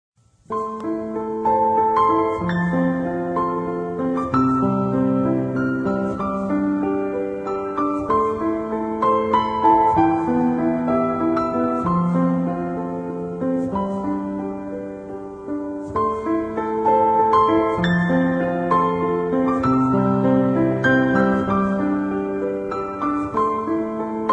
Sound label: Sad Piaono Tone